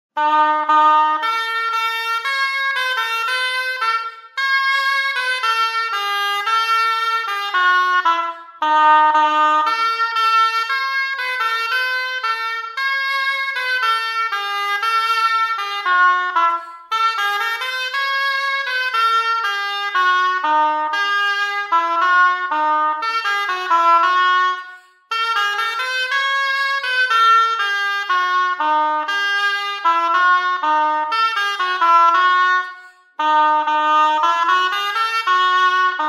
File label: shawm